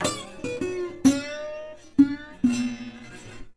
Blues Guitar Samples; ILIO - Fretworks

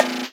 Percussions

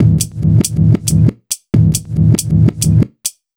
Big Beat Drumloops, 138 BT; USB Soundscan vol.25; AKAI; MOVE 138

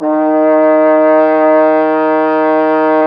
FRH, Horns

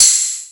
More Cymbals, E-MU Pro-Cussion

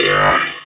bass stretch; Sonic Foundry (Sony Creative Software); Synchro Funk; One Shot Sonics

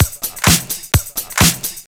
Straight Loop 128 BPM, House

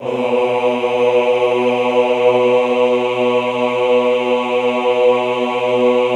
AHH B1 L, Classical Choir; Best Service; Extended Classical Choir; AHH LO+HI LL

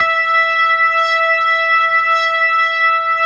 E5, B3

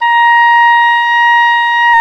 OBOE, Orchestral Winds, Oboe mf f; Roland LCDP04 Orchestral Winds; Oboe 2&3

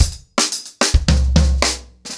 110BPM, ACOUSTIC DRUMS

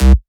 Basses Root C; Synth Shots and Basses; Vengeance - Essential Clubsounds Vol.4; Note C

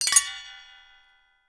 TRASHY METAL, Definitive Percussion

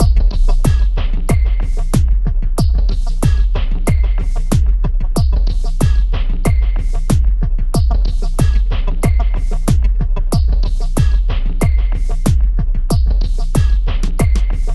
Processed Beat, Drums & Percussion; Electrocution; Processed Beats

